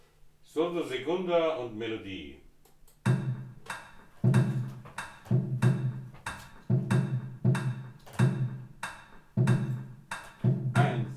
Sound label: surdo segunda melodie